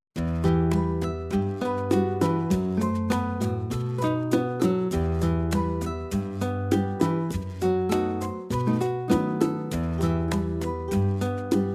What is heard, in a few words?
AFRICAN RUMBA